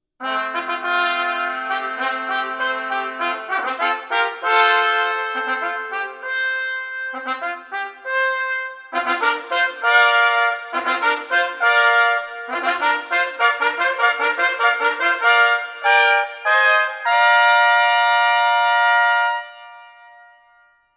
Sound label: fanfare, trumpets